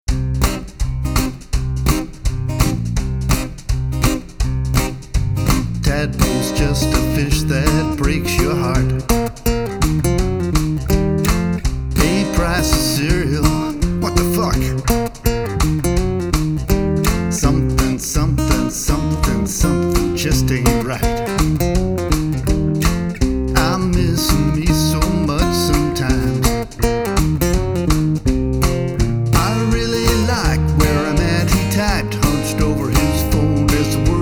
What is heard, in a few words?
tweet song